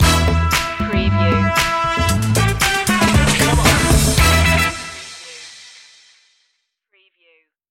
shake n break stinger

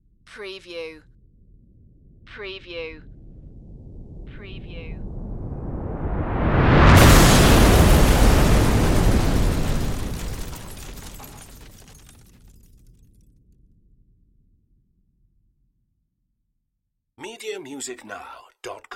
EXPLOSION SWELL BLAST DEBRIS

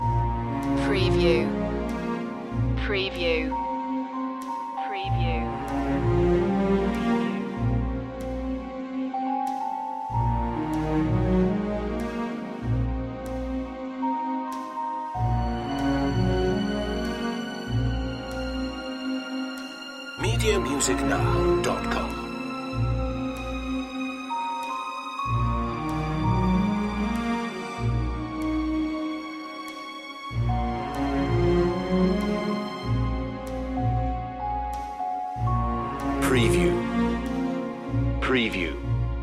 pensive feeling loop

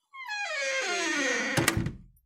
Wooden Door Close With Door Creak; Sounds of doors and windows opening and closing, keys locking / unlocking doors

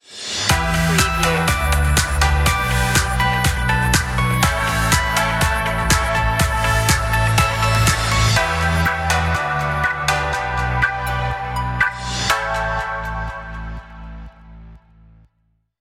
sweet dreamy inspiring house

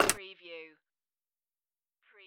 Pinball sound, ball drop and hit metal

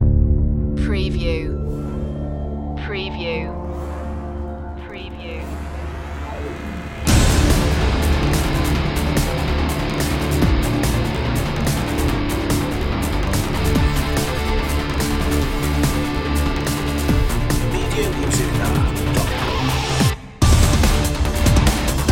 arcade rock

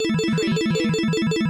Casino Slot Machine Organ Loop

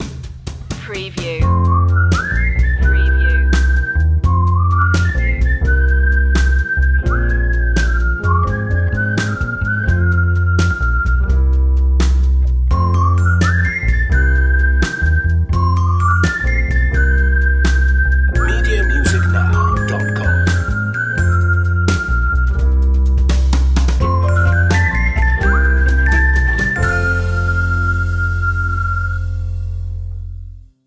nice and easy whistle

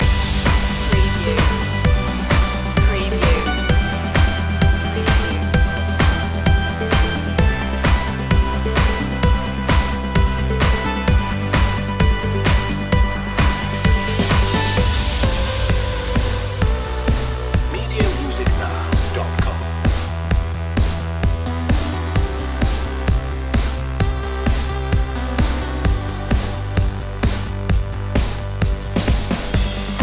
positive energy loop

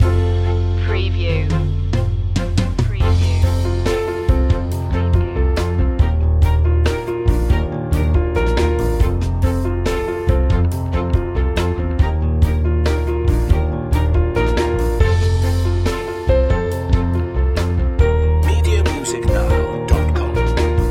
acoustic mood

